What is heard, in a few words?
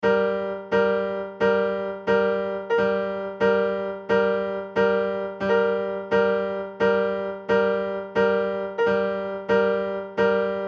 bass